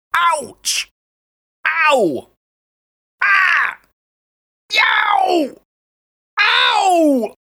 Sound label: Pain Shouts, Various vocal phrases expressing pain.; Pain (Sound Effects:Voice)